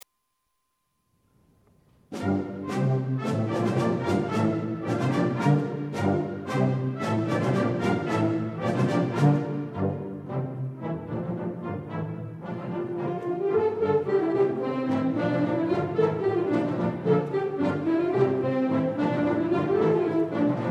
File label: Second Suite in F for Military Band Song of the Blacksmith